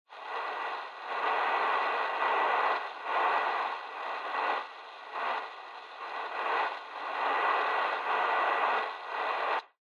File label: Radio Signal Noise AM